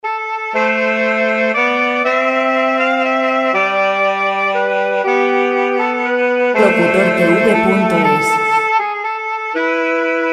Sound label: greensleaves 130 classic; Greensleaves- Tradicional